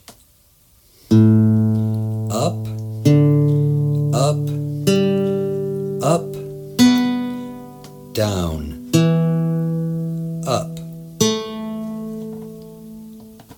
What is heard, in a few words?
reentrant theorbo tuning